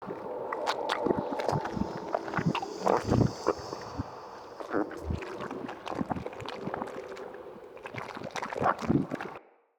Corruption Grip Loop; Enemy; Lady Deora; Abilities

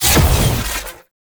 Rifle Alt Shot C; Tenno; Gyre

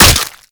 Berserker Barrage Hit Flesh D; Warframe; Warframes

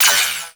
SMG Shot 2D; Warframe; Tenno; Prime Tenno SMG

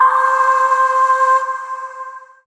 Void Shawzin Oct Three C; Warframe; Zariman

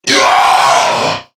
Death Crewmen; Warframe; CorpusCrewmen; CrewmanA

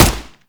Twin Assault Rifle Fire; weapons; Warframe; Grineer

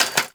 Cafe Chair Short; Warframe; Nineteen Ninety Nine

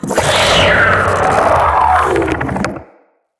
Spider Vocal Attack; Vocals; Root Spider Mother

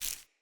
Grunt Foley Step; Enemy; Characters; Corrupted Sproutfolk

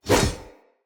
Whoosh, Swords; Weapons; Mockery; Mockery Brazard

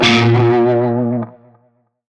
Shawzin Flourish Oct One A Sharp; Music; Warframe; Narmer Shawzin

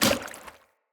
Heavy Grunt Boot Walk Water, Footsteps; Enemy; Sinecure

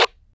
LasrianEnemyA Static; Warframe; Battle Barks

